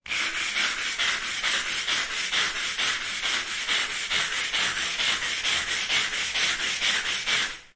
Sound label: brushing, household sounds